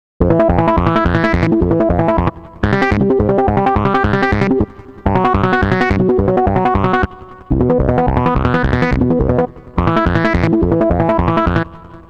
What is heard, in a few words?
note sequencer; the meditation soundset